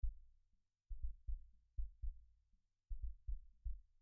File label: Mini V VCF 20 hz